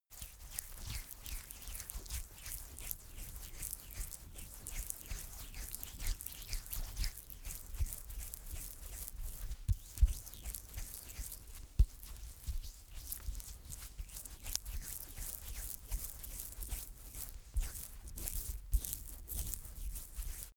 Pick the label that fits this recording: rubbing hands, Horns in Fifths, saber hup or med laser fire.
rubbing hands